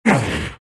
big pain; Undying

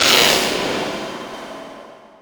open wounds cast; weapons; dota; lifestealer

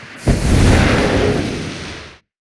dual breath; weapons; dota; jakiro

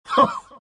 laugh; dota; chaos knight

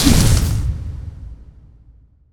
spawnlord freeze; dota; creep; neutral

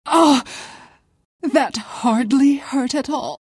pain death; queenofpain

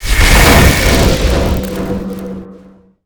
cold feet; dota; ancient apparition

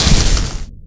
impact; dota; lich

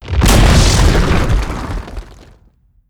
tower destruction; physics; damage; building

